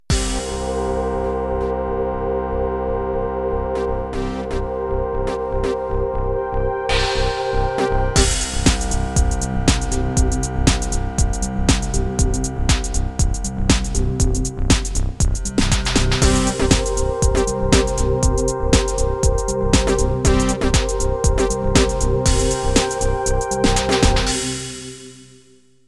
Progressive Intro

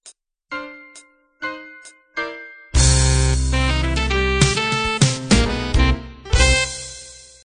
cool jazz end; Dixie 2; A "roots" Dixieland style with Tuba, Honky Tonk Piano, Banjo and Brass

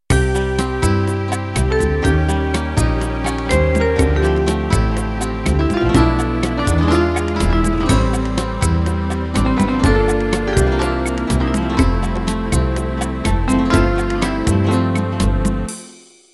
Latin Unplugged Intro

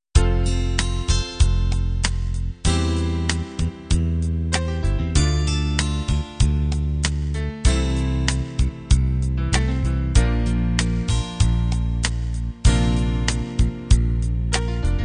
rock ballad

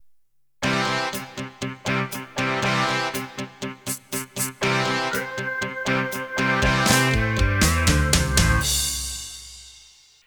Open Rock Intro; Intro #3

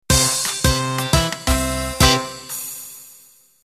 Flapper end

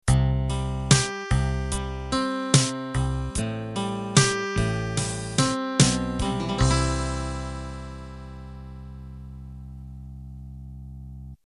8Bt Standard End

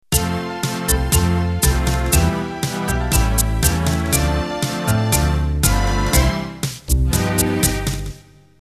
Tango Ballroom Intro

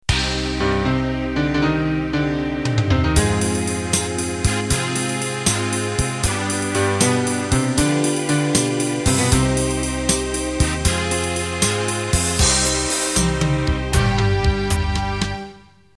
12/8 Pop Intro

